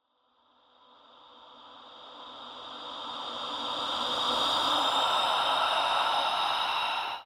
Female Ghostly Breath, Reverse, Exhale, Air, Spirit, Ethereal, Wet; 344 Audio; Supernatural Ghosts